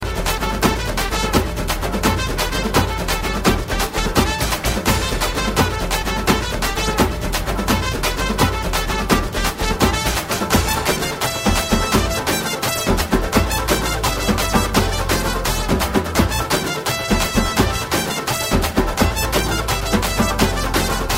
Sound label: Desi Remix Ringtone